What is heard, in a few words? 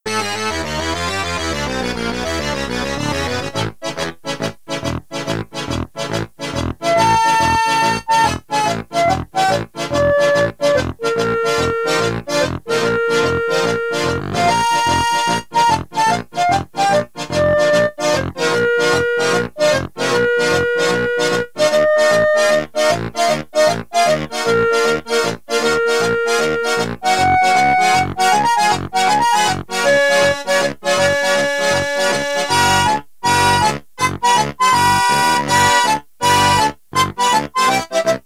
pizza theme